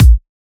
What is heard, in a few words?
Kick; ENZO Drum Signature Bundle Vol.1